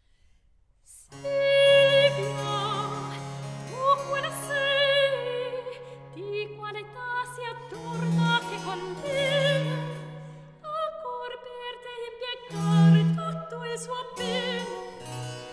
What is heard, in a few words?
Cantata Recitativo; Cantata RV 660; La farfalletta s'aggira al lume; Vivaldi; Bellezza Crudel; 2L